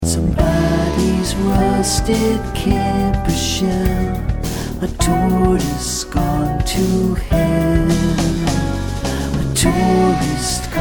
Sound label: Vox